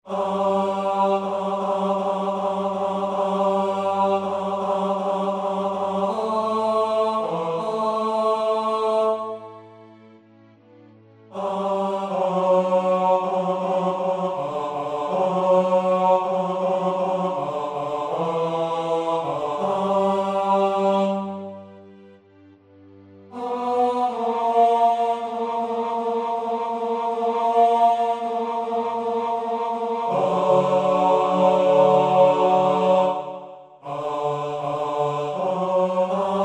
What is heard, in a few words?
Verse Men